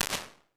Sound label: rhodes thicken; Ambience and Small Rooms; Ableton; Convolution Reverb